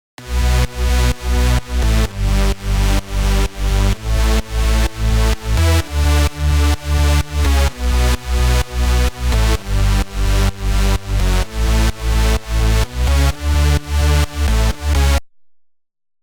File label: Bass full